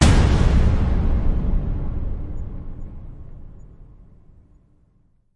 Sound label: Hit Big Canon Balls; Brand X Music; Brand X Music Catalogue - Editor's Essentials